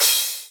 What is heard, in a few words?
cymbal short